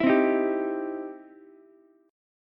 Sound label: E Minor Major 7th Sharp 5th, E, down, Ukulele